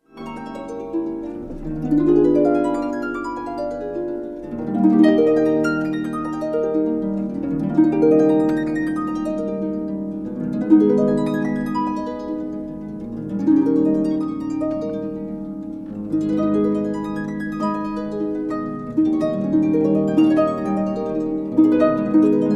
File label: grand duet for two harps in E flat minor